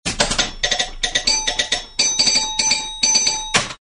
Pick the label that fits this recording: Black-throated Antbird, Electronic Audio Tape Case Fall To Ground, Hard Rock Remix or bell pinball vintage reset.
bell pinball vintage reset